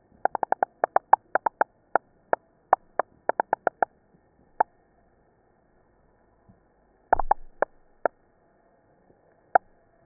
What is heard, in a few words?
pop sounds, fish